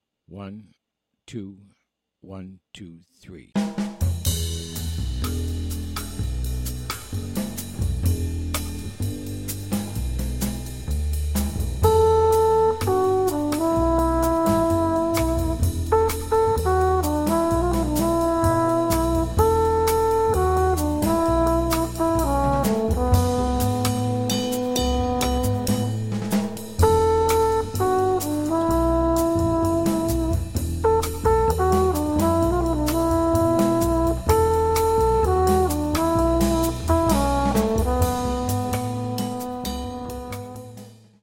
minus piano

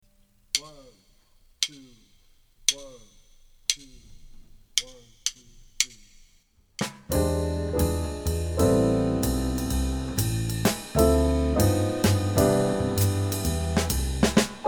minus melody

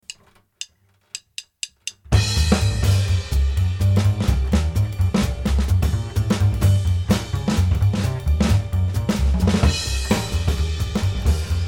bass drums only

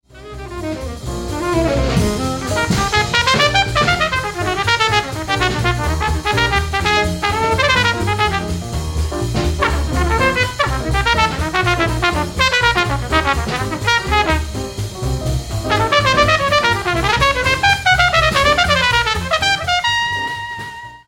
solo master

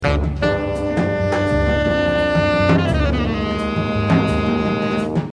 interlude

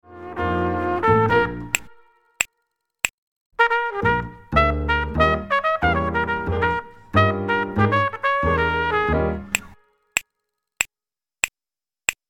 medium minus Drums snaps

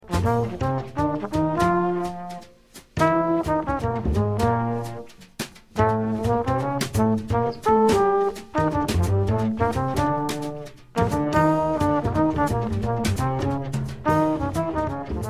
no piano

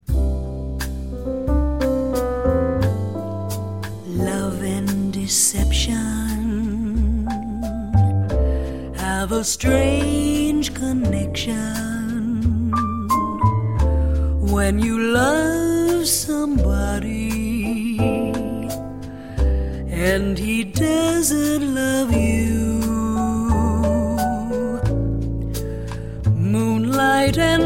bossa

